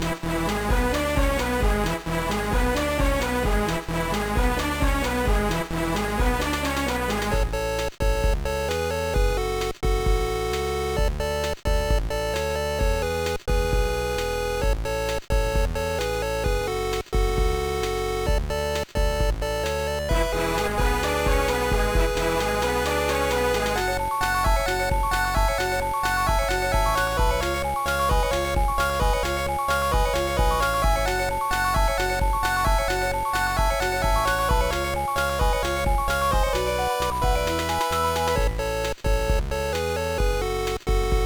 BGM; Zettai Zetsumei Dangerous Jiisan Shijou Saikyou no Dogeza; gba

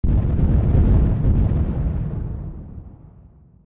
Balloon Blast Boss

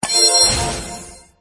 Click

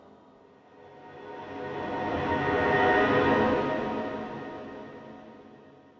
echoes; thief 2014 gamerip

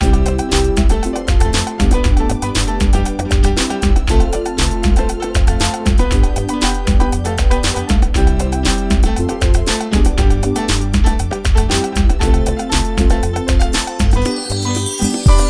Alegre Cancion (No Vocals); Generation Girl Gotta Groove

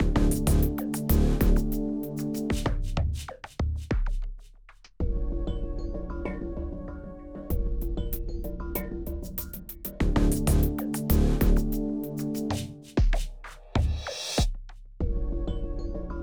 BGM Amb District

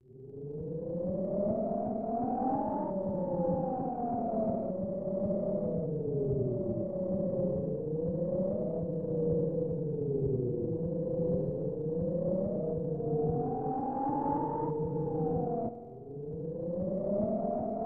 Howling Wind; marvelous; snes

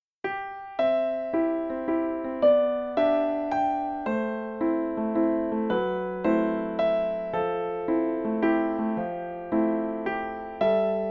Piano Arrange Version; solfege vocal collection

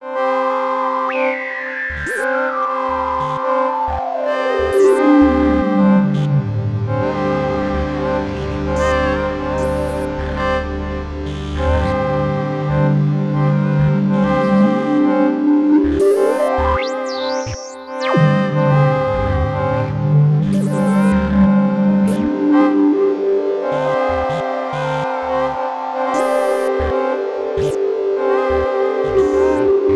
Bubble sequence; Bulb Boy; PC Android iOS Switch

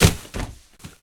Box Cardboard Package Drop

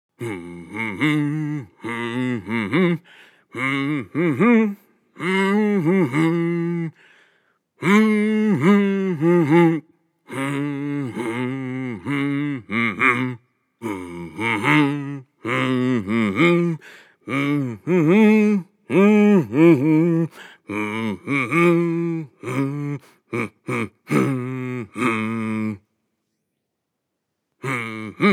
Humming at the Mine; Legend of Zelda The Breath of the Wild Original Soundtrack Limited Edition

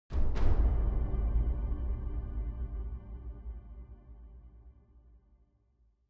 Big Drums; Citdel

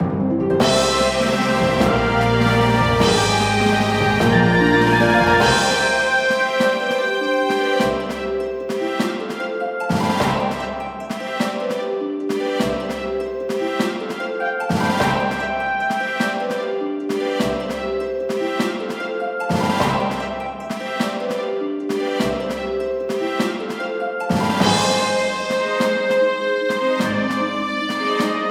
Victory; Winged Sakura Mindy's Arc

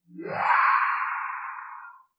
Woosh; death the ascension 2020

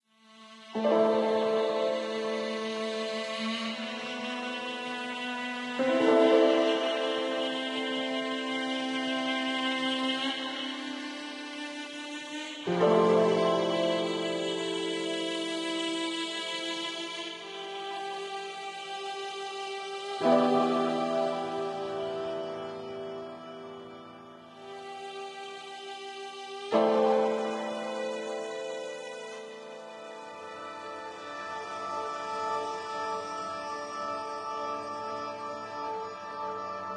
Harbour Sting; ether one redux gamerip 2015

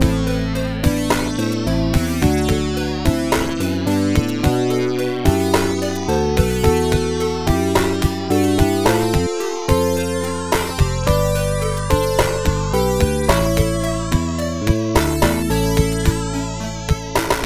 Lose

